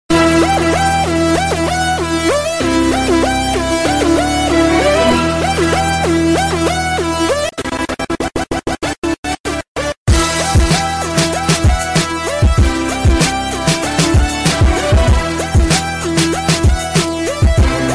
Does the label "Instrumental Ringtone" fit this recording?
Yes